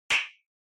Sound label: Castanets